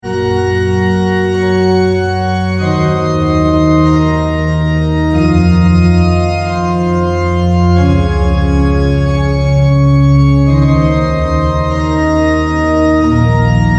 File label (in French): orgue, rosacrux